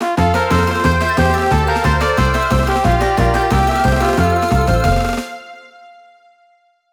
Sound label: Bonus Win; Donkey Kong Country fan remastered